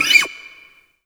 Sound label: Yamaha TG100 FretNoiz